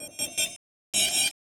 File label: Industrial 160